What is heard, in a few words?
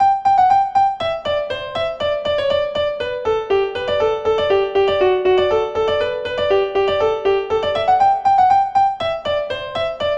Russian Dance; PITchaikovsky; Op71a